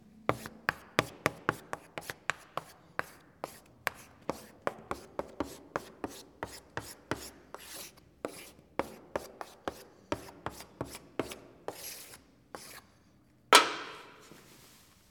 Chalk Writing

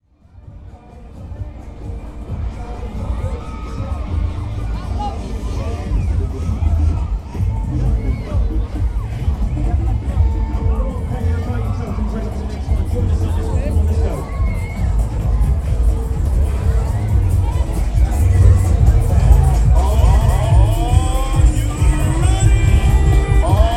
Funfair

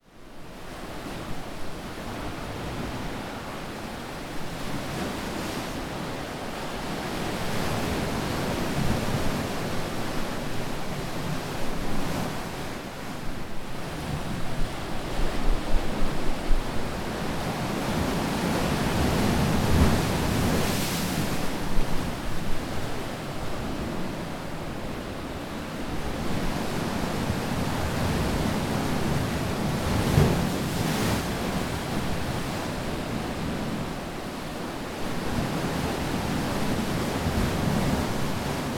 Waves On Sand

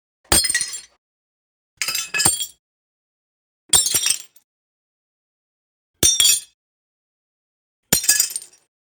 Glass Breaking

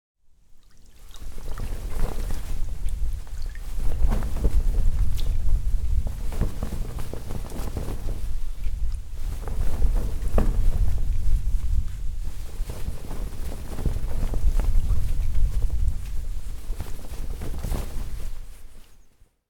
Sail

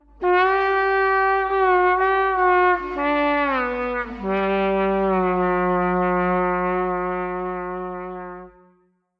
A Sinful City

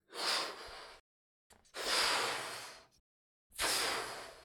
Inflated Balloon

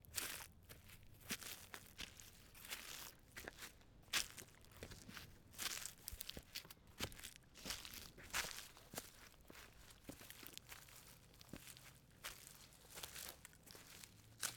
Walking On Dry Leaves

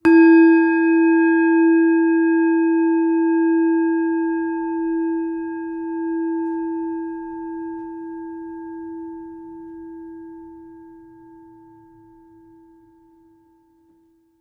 Chalice chime

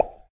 Mouth Pop

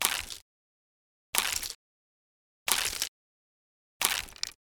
Footsteps

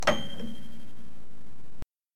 Piano

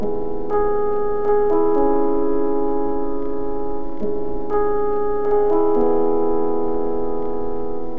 Chord Melody

